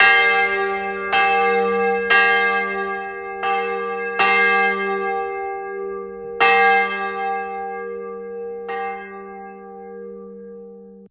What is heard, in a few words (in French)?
CLOCHES